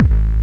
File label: BD, Dance Mega Drums; 90 s Sample CDs; Best Service